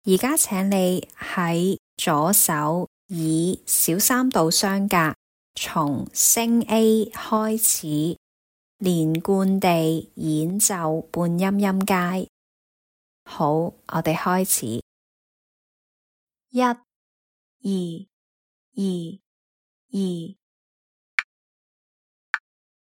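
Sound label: chromatic scale minor 3rds starting A sharp left hand legato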